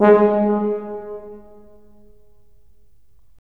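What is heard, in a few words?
brass tuba; LA Composer vol. 3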